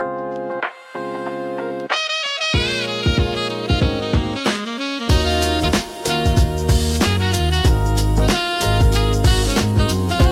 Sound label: uplifting lo fi for youtube intro saxophone rhythmic